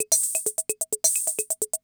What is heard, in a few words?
CR78